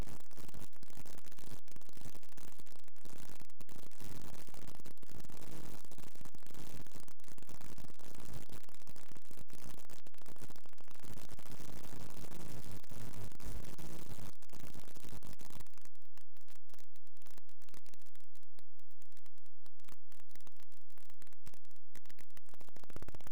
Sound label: weird noises